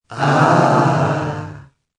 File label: Crowd Ahh, Small crowd, studio audience; Crowd Sound Effects; Lynne Music